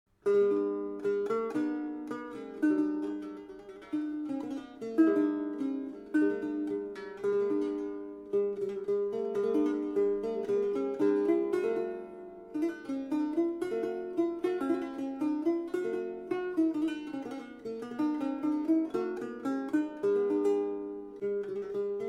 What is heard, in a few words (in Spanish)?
pavana al ayre español, baroque guitar; Gaspar Sanz